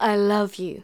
Female vocals and robo-vox

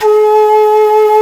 shakuhachi G#2; InVision Interactive; Lightware VOL-1; Instruments & Percussions

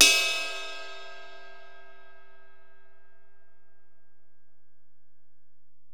Ride, Alesis DM-PRO

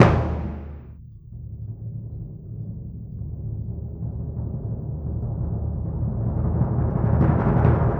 Bass Drum, Orchestral Series Rock and Pop

